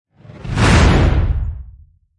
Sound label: Evolution Wipe; Ninja Tracks